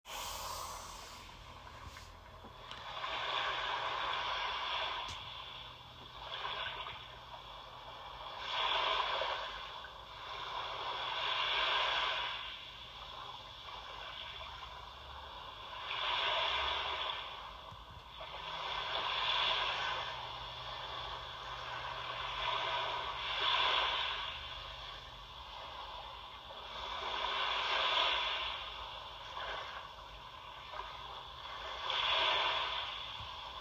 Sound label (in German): Naturgeraeusche Meeresrauschen